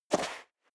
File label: step high heels sand single right